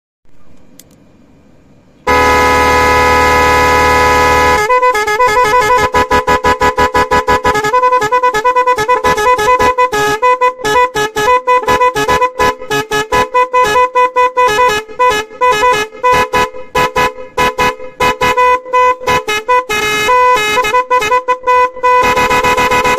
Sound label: Horn; بوق بادی دری وری